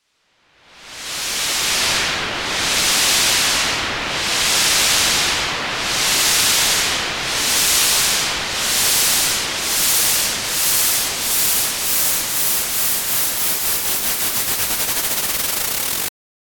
White Noise Lasso

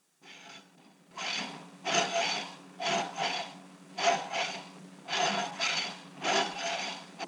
Metal Scraping

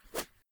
Arrow Fly By

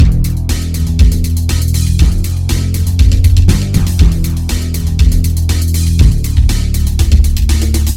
Pop Rock Loop

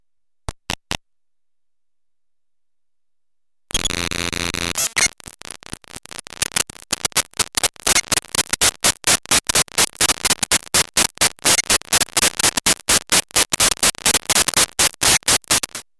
Dvd Noise

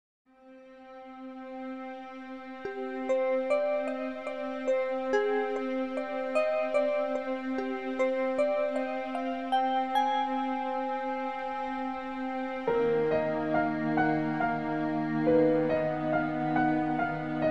Deep In Thought

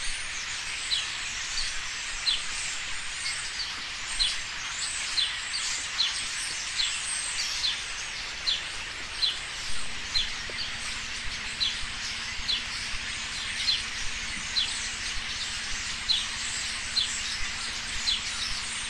Birds In A Tree

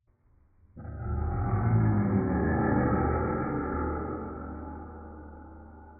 Dragon Roar

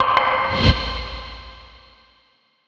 Transition Hit And Whoosh

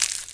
Crackle

